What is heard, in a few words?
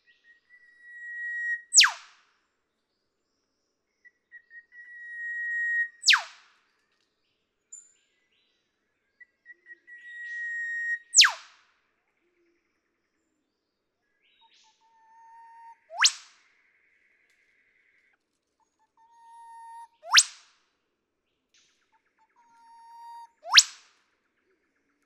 Eastern Whipbird, birdsong